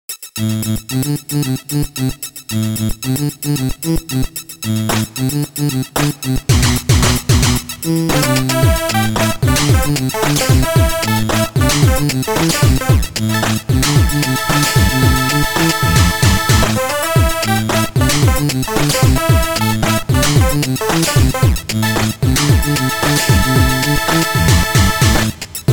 70's Disco Floor

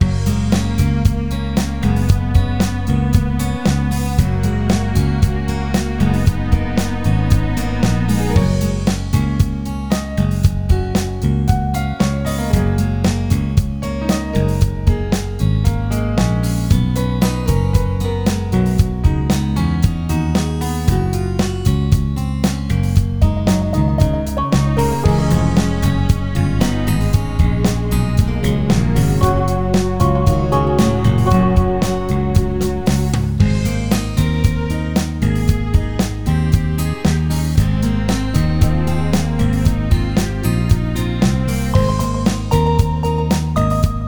Petcare Music; Animal Planet Vet Life; NDS

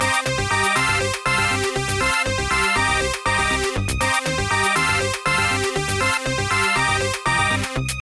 Aerobics; Dreamer Series Top Model; nds

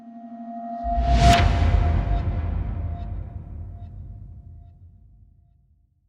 Transition; entwined; ps vita

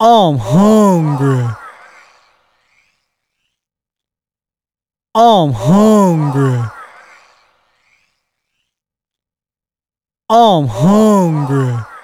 swords

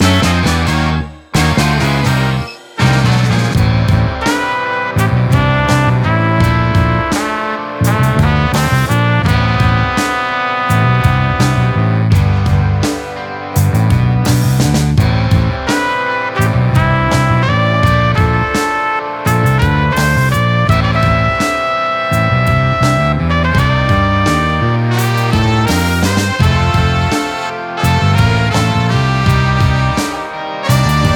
Gameover; dragon poker; ps3; ゲームオーバー画面

